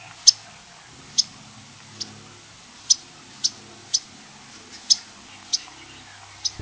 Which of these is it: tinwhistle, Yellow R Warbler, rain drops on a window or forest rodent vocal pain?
Yellow R Warbler